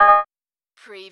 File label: Servo Panel Sound - Transit